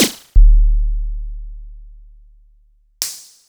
Noise Engineering Basimilus Iteritas, Samples Sliced; m8